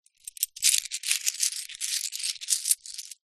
foley money coins handful handling movement single hand; zapsplat